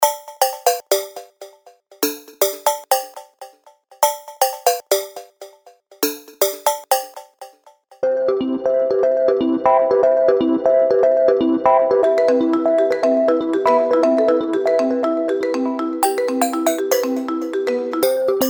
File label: DEEP HOUSE